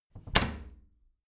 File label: bathroom cabinet doors close heavy; objekte; tueren